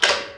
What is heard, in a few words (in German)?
schalter